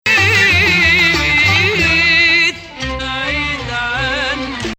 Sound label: Nahawand - Bayati